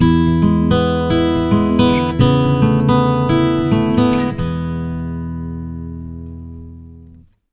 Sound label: 110 MAJ PICK E, Acoustic Guitar Loops; USB Soundscan vol.04 - Electric & Acoustic Guitar Loops; AKAI